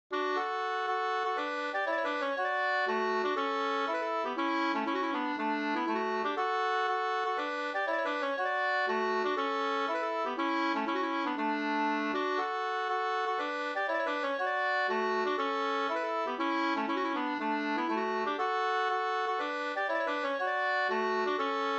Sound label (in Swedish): polska Bodapolska